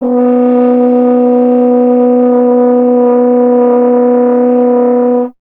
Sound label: F. Horn; Miroslav Vitous; Solo Instruments VOL-2